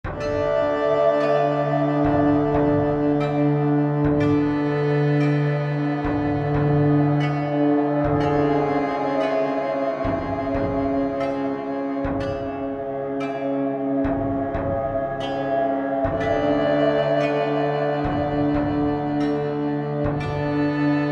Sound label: Horror